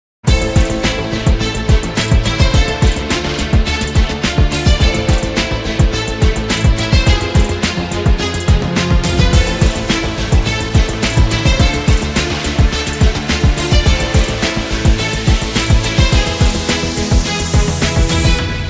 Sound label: Dramatic